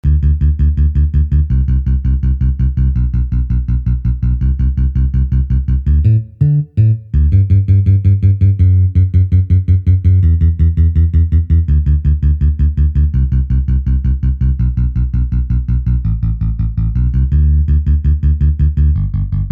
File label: Bass Mono; BattleCry